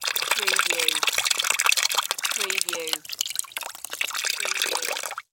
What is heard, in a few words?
Soup Can Pour; Sound Effects Bible